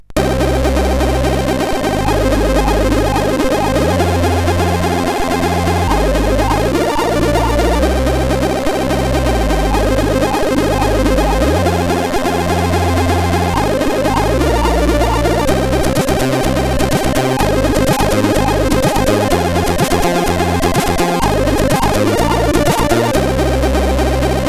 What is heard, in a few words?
Intro, sid CSG8580R5